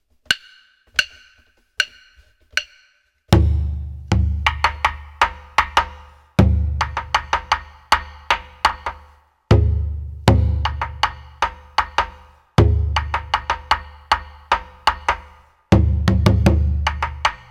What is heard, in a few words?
Taiko